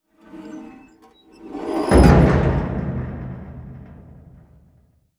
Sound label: Bucket Bottom Close